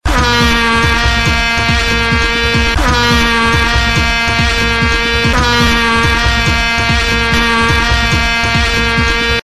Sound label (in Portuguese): Vuvuzela, barulho vuvuzelas